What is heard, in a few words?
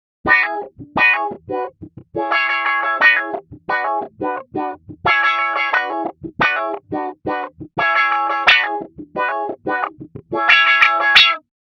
Auto Wah